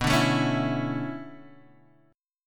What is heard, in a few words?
B Minor 11th B down, Bm11 chord; strummed; JGuitar